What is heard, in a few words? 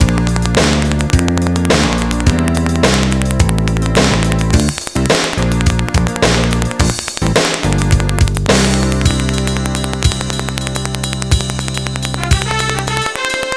Untold 1000s Chorus Out, Jazz; Sound Factory